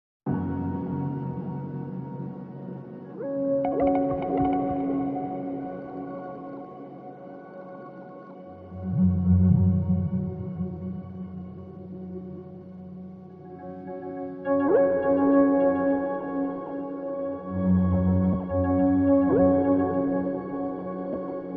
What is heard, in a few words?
Twisty Synth